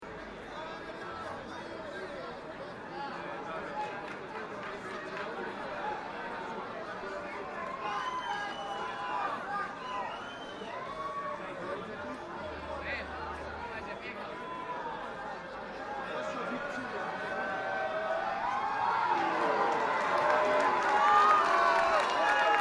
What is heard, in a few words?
England London Football Crowd Reactions Small Crowd Light Cheer Clapping Booing